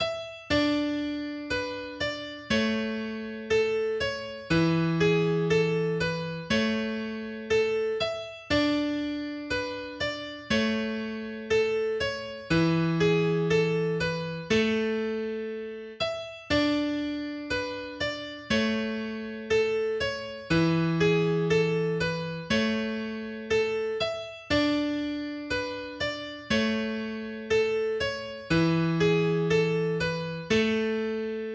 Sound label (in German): Ein niederländisch Tänzlein, mehrstimmige Gitarrenstücke; Newsidler